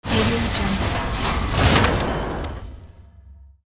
factory garbage

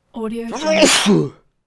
sneeze

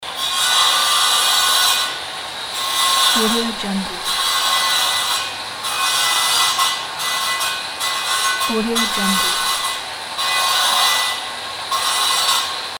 electric saw

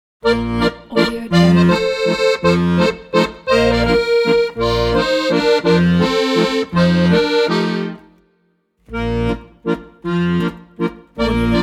accordion solo

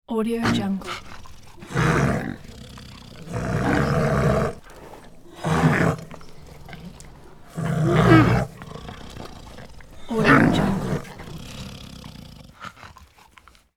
bear foraging and snarling; sound ideas

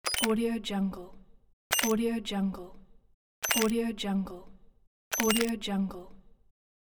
interface buttons

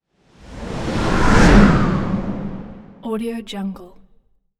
elemental wind cast gust medium; soundmorph

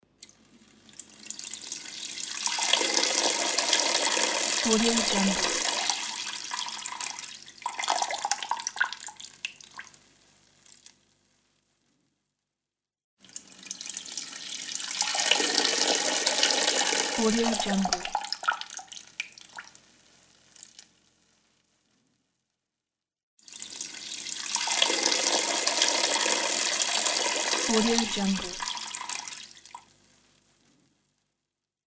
peeing

